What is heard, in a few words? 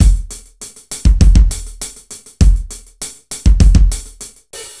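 Modern B, funk; фанк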